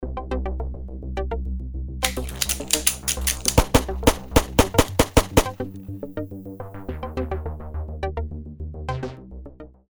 Ambisonic Handguns Sig Sauer P229 40cal

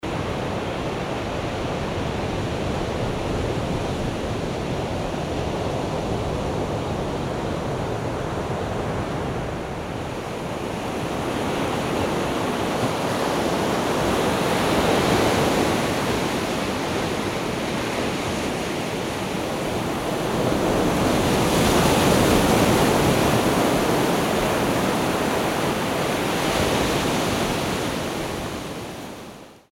Pacific Beach Ambisonic; pacific coast beach ambisonics

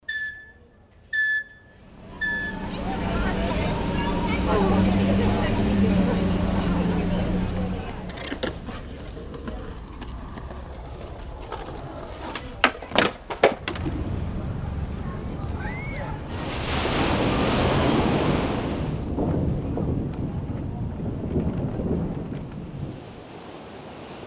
California Beach Town Ambisonics

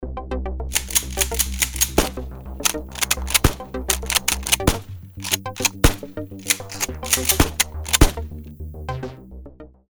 Ambisonic Shotgun Mossberg Maverick 88 12 Gauge

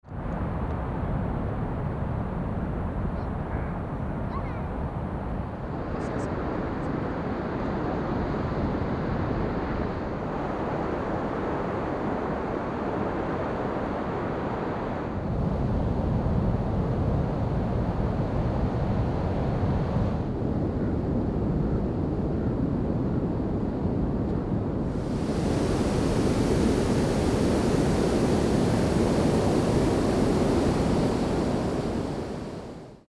Ocean Beach, ambisonic ambience

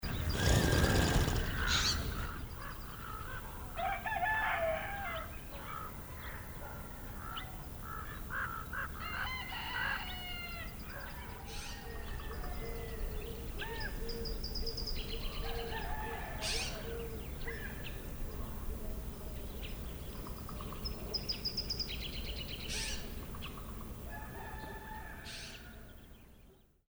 Thunder Ambisonic; Navajo

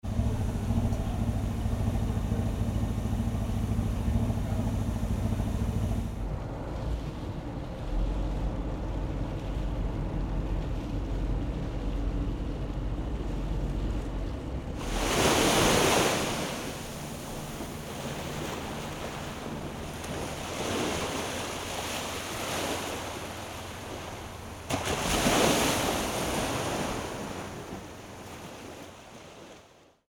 California Harbor, ambisonics

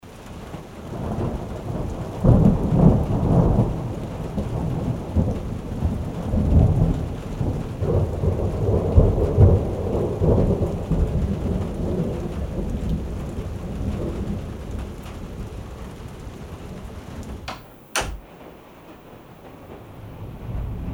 Rainy Cabbin Ambisonic; rainy cabin

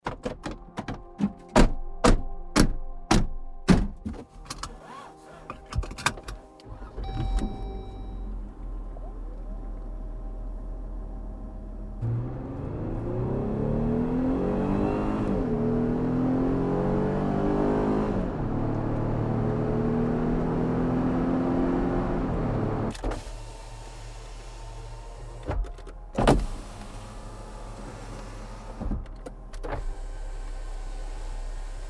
2022 Jeep Wrangler

